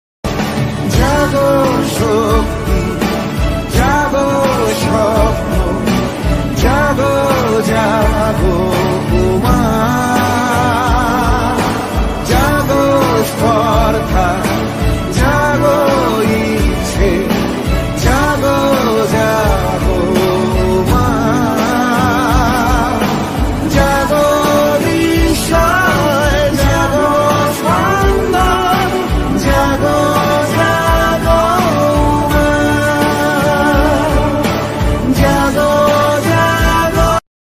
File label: durga puja ringtone; filmy dunia